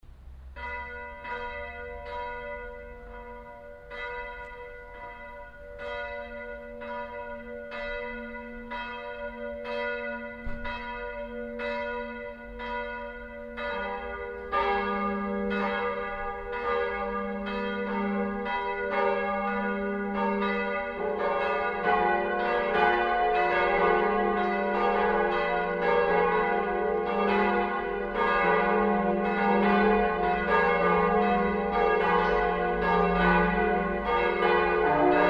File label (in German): Glocken; TeDeumGloria (Plenum); Hochfeste, bes. Anlässe; z.B. Firmung, Erstkommunion; St. Lorenz